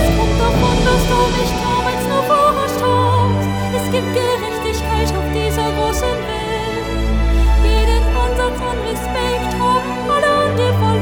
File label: Classical Opera; Opernfee